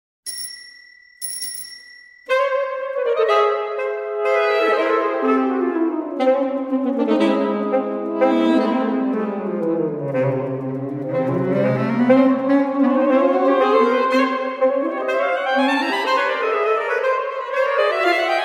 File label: Slipstream for saxophone quartet and bicycle bell